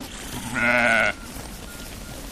Sheep Baah CU

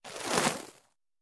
Arrow Bys Fire Sizzle; bow & arrow bys

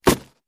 Bullet Hits Wood Soft

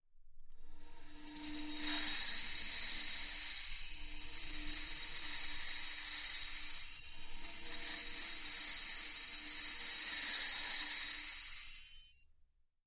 Bells In Cymbal Bow, cymbal & gong; Mini Bells In Cymbol Bow

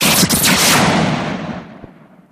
Hand Phaser Sngl Sho

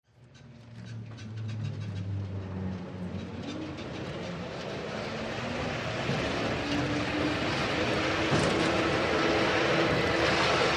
Crane Motor Movement

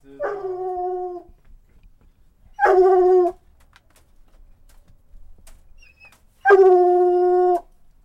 Coon Hound Dog Howl X3

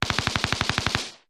Gunshots Machine; fires

